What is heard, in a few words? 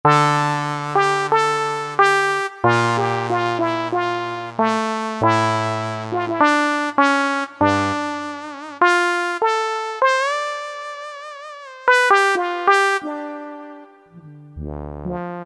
Lyricon